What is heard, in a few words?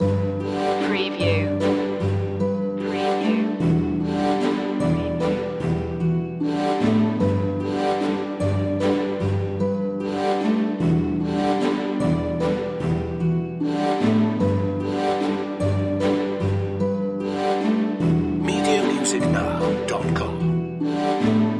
regal waltz loop